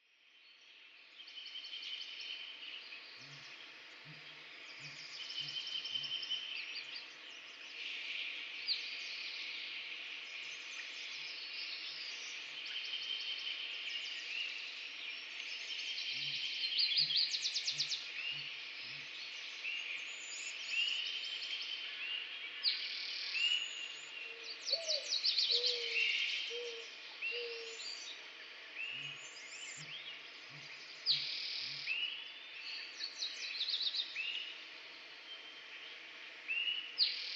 long bird, ambient

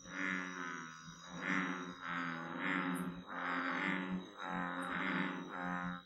bullfrog

